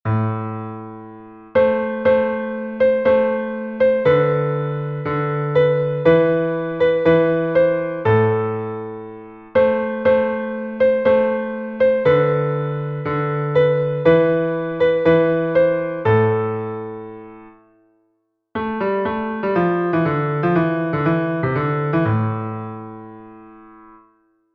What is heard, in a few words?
Tarantella with ritornello; Kircher